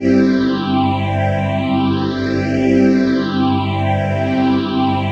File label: PHASE PAD, ATMO PADS; Best Service; XX Large